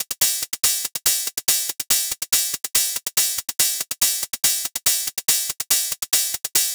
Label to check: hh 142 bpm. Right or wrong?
Right